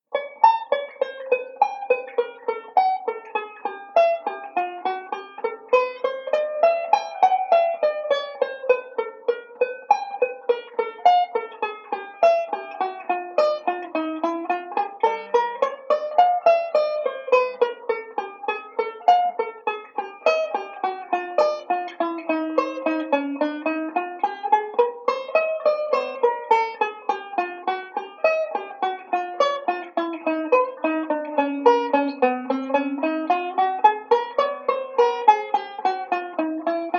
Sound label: slow, chord melody; Beyond Chord Melody Volume II